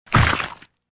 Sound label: Enemy Dragon Dead